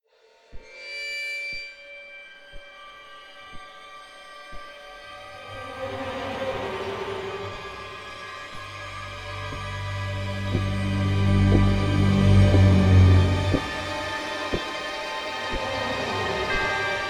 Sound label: Strings only